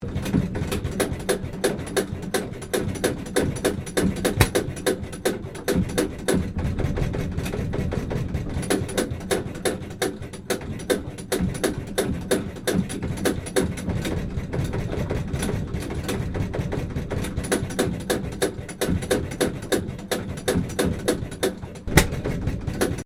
BOAT SMALL ENGINE INBOARD RUNNING BADLY, Inboard boat engine running badly; Vehicles